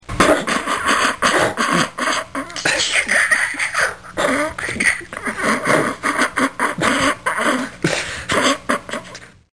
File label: Snicker